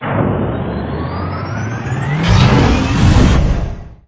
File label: Cardassian Enter Warp; NanoFXv2; WarpFX